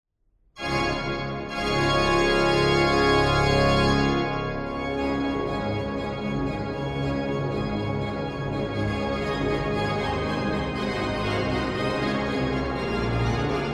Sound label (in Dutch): orgel; Groningse mars